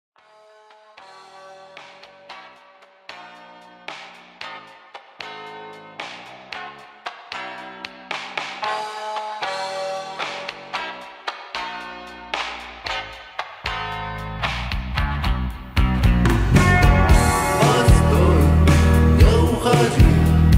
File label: slowed reverb doomer music doomerwave